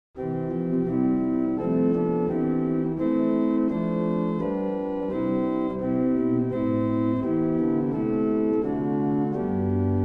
Montre; CavColMainz

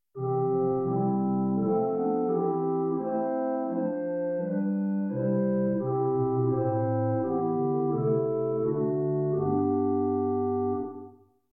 Tibia 8, organ; Paramount Middletown

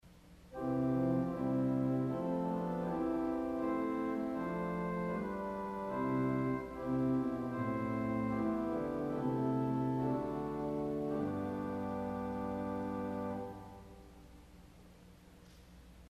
Viole de Gambe St Anne, organ; CulverAcademy

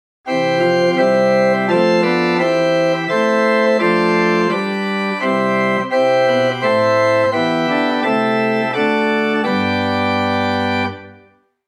Prinzipal Oktave Mixtur, organ; Grace Lutheran Champaign

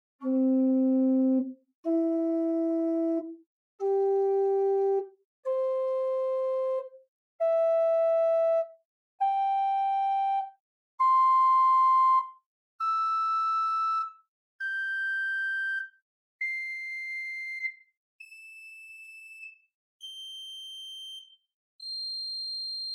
Flachflote 2, organ; Buzard Opus 3

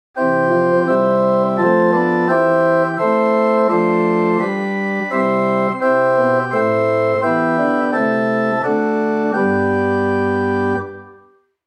Hw Prinzipal Oktave Flote; Grace Lutheran Champaign

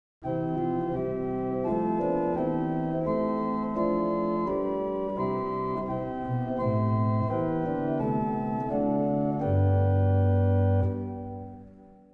Cor De Nuit Flute; CavColMainz

